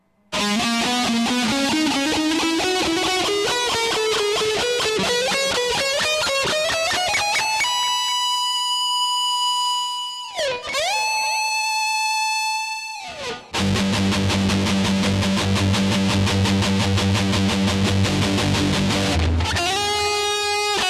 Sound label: music test sounds